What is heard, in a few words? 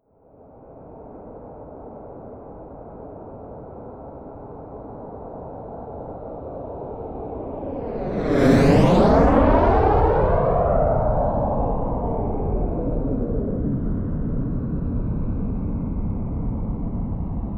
military jet eurofighter typhoon flyby fast close; flugzeuge jets; verkehr transport